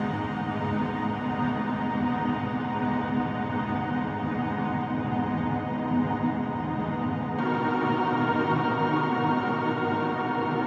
Atmos D# 130 bpm, Synth; Loops; Tonal; Ableton Live 12 Lite; Core Library

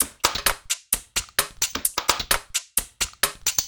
UK Club 130 bpm, Drums; Loops; Top; Tikka; Ableton Live 12 Lite; Core Library

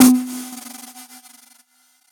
Snare, drums; Ableton Live 12 Lite Core Library

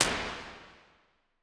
Plates Modern Dark Plate L; Impulse Responses; Ableton Live 12 Lite

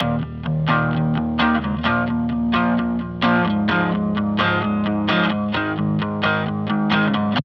powerchords; FL Studio 9; Image-Line; SolidInc

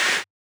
Noise, FX Hit; One Shots; Drums; Ableton Live 12 Lite; Core Library

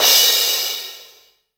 Crash DMX, Cymbal; One Shots; Drums; Ableton Live 12 Lite; Core Library

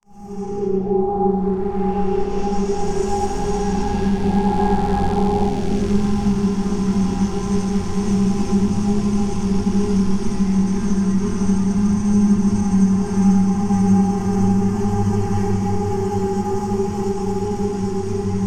Atmos, Synth; Loops; Tonal; Ableton Live 12 Lite; Core Library

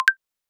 two tone up clicky; Logi; Loupedeck Sound Pack

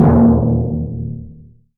Timpani Orchestra MPC; Drums; Misc Percussion

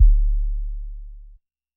Kick 808 Deep

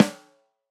Snare, drums; Ableton Live 12 Lite Core Library

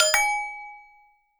incoming call active; Palm; webos-applications

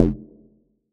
Synth Acid C1

